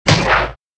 damage

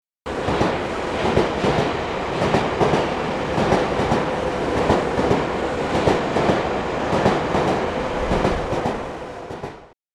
train run

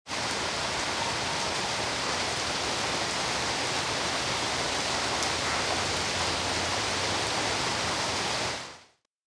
rain

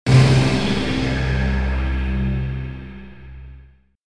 shock on

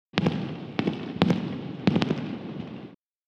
ban ban ha

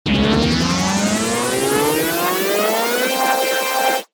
gauge up

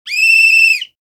peee whit

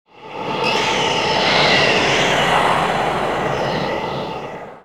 missile

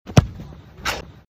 socc goal

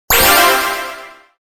rare item

